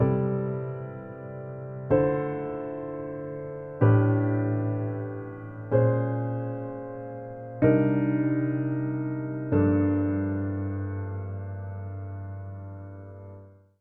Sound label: piano